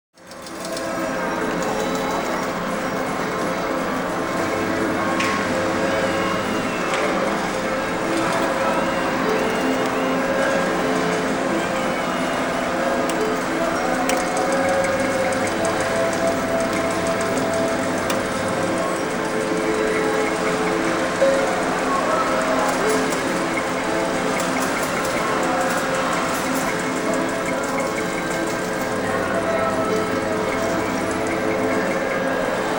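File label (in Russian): казино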